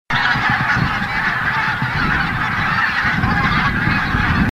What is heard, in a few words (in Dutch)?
Toendrarietgans, trekroepjes; tijdens de vogeltrek